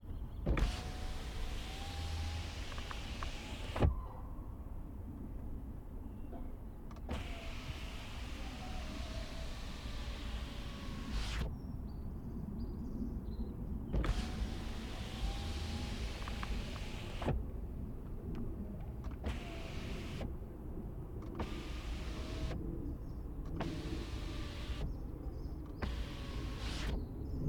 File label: BMW X5 M window open close; SPOT A BOOM